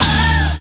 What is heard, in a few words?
choir